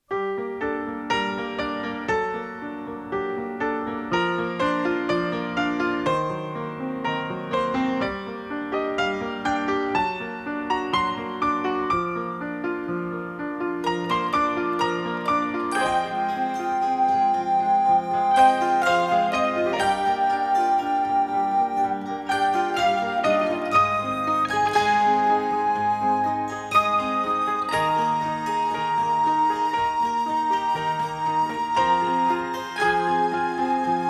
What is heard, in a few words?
soft caress